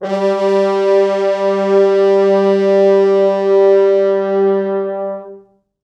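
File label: 4 FrHorns SUS, French Horns; G2; East West; Quantum Leap Horns Sax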